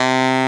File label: Tenor Sax C3; soundbank; instruments